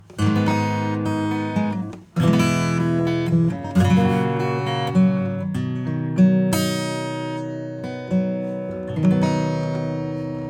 acoustic; Pulsar

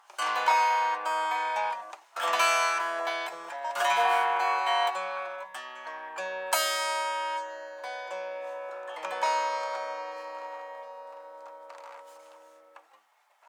FILTER HighPass 4 pole CUTOFF 770Hz; Valves; REONANCE 60

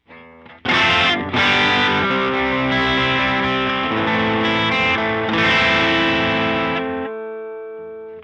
guitar; SKYE Clipper

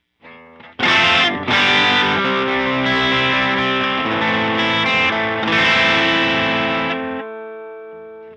Upmix Mono Guitar; StageOne

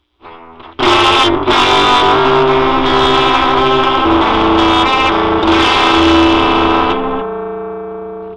LFO; Moogerfooger MF-103S

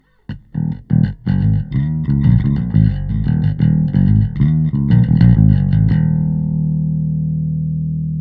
Bass; Neutron 4

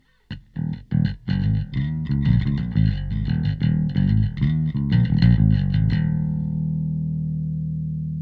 bass Bass; Gem EQP

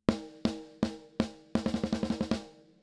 Compressor snare Weak Snare Fixer

